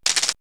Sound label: "Guiro" Long, perc fx; X-Static Goldmine